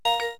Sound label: cam stop; LG SB260